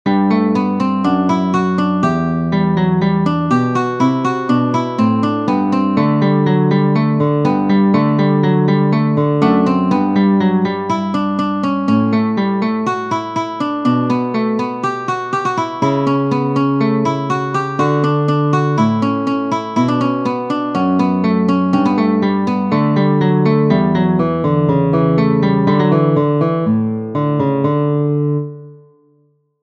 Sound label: Sonata Gavotte, guitar; Weiss